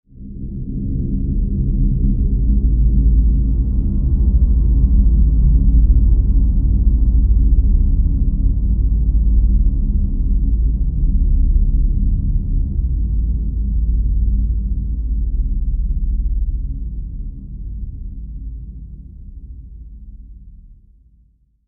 Outer Space Ambience, Science Fiction Sound Effects; Outer Space - Ambience 2